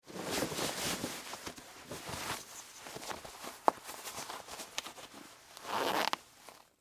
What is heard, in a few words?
Jeans Put On, Foley, Clothes, Jeans, Put, On; Dressing; Human